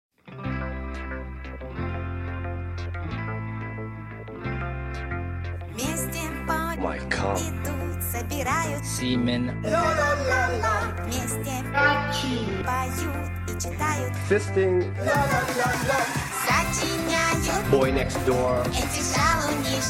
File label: Gachi Remix